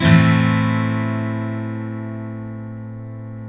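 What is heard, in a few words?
steel A minor up, CHORDS STEEL, Guitars; Spectrasonics; Hans Zimmer Guitars VOL-1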